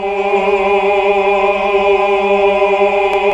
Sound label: HAL AAH. AAAH Uni